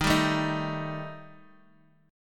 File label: D sharp Major Flat 5th D sharp Guitar Open D, chord; x,6,5,3,6,x; chordshape